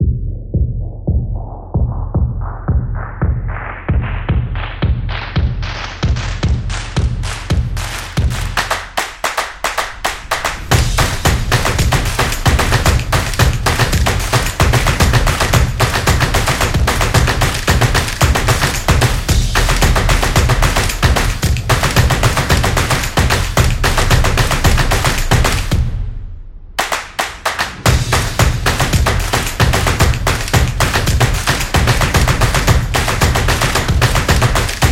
The Drums Upbeat; percussive nation vol 4

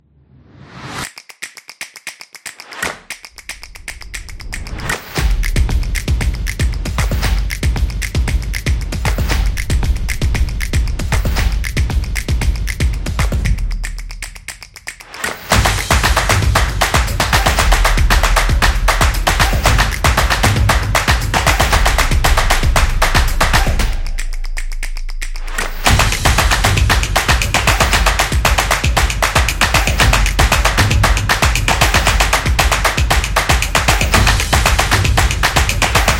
Upbeat Clap Stomp Kick; percussive nation vol 9

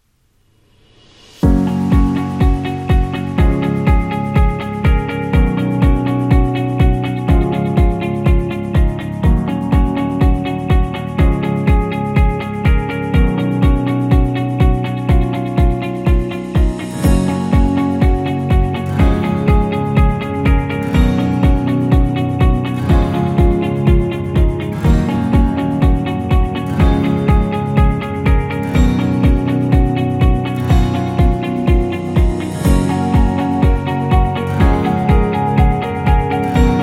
Motivating Interview; agency promo

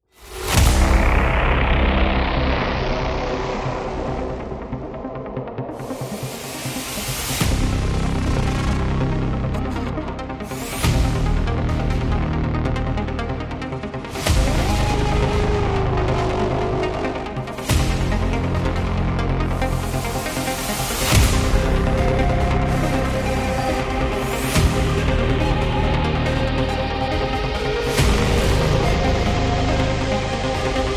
Promo Epic

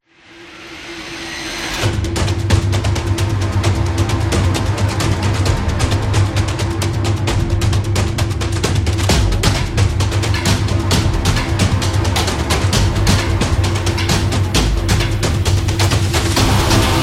Horror Chase; monster attack

